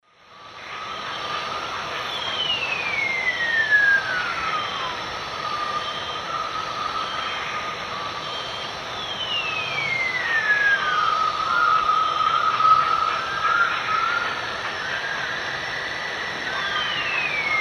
Crax globulosa, song; S. America; Eastern Lowlands

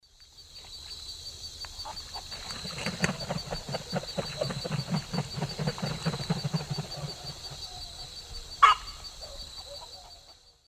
Mycteria americana, flight calls; Eastern Lowlands

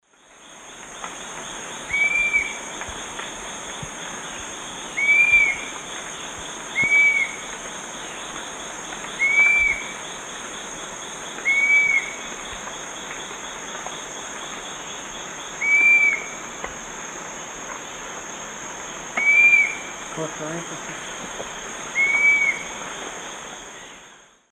Myiarchus tuberculifer, call; S. America; Eastern Lowlands

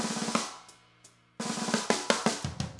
jungle 170 fill; Sound Effect Library

